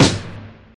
full snare sample g key, steel snare drum sound tuned to the G note; snare drum samples